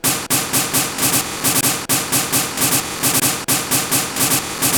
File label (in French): Son Le rythme